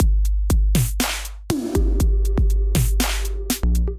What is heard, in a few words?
Kit, Drums, Hybrid; Main Frame Kit; Ableton; Factory Packs; Drum Essentials